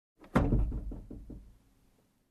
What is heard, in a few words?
Car hood closing